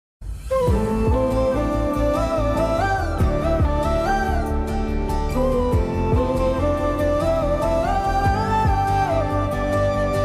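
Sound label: love feel background music music; das ka dhamki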